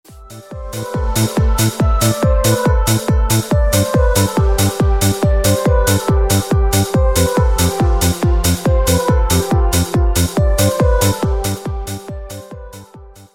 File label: Dream Symphonic